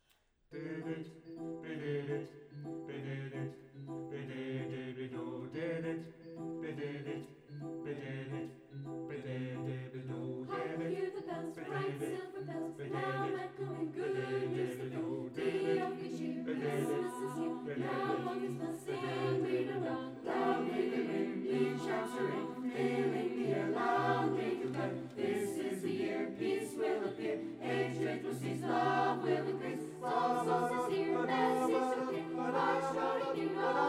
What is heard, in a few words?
Mixed Choir Carol of the Bells